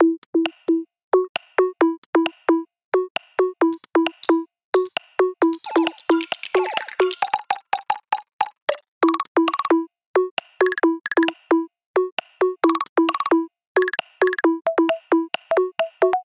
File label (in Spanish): fiesta de baile